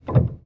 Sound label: piano release